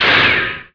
shot; BESTSHOT; noisybit

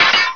Sword Clang; Castles - Siege and Conquest

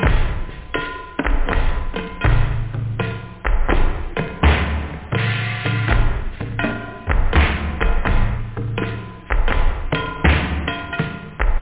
bell

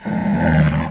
lion hit; DAoC

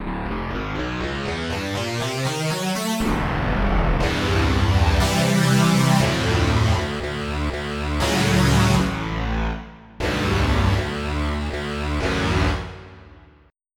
PWR SAWTH; papik

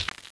stop; Windows Surprise 3; bermuda

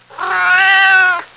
cat meowing; pets; Sound Effects for Multimedia - Volume 1

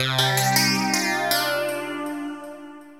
ELECGUIT, AMBNT, 160, C; eJay; Ambient; Guitar

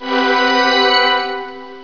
HEAL; THEREALM

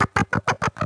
Chicke; farm; breakout

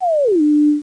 Tone Down; Let's Discover Massachusetts

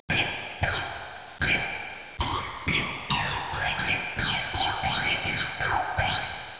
fusion; 10,000 Graphics Pack Volume 1; Media Graphics International

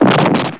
explod; duke nukem total meltdown

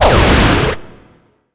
explosive; Earth and Beyond; EA Games

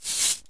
two shake; music; Wired for Sound Pro CD; Aristosoft, Inc.

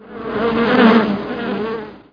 BUZZ; robotron

